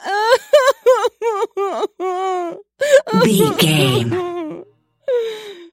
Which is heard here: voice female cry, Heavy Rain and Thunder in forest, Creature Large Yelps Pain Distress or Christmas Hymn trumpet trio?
voice female cry